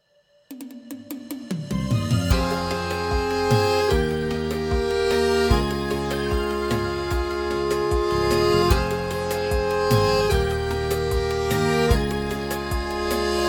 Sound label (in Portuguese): sertanejo na sanfona; maycon gravacoes